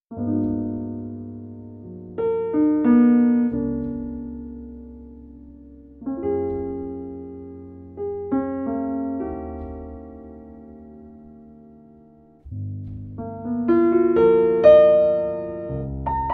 Coffeehouse Instrumental Beats; Easy Jazz Music; Restaurant Instrumental Jazz